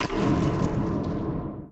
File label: Impact; Phasmophobia